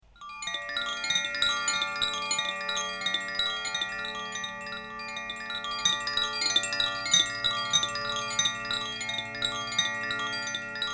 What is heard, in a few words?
water